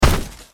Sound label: foot; darkwraith